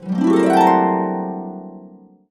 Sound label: C, Harps